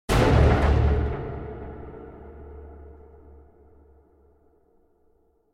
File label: Zombie Fight Transition